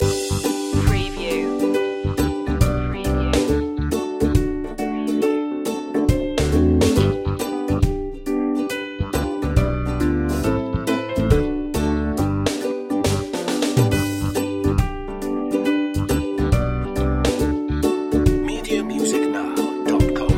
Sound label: skip to my lou instr loop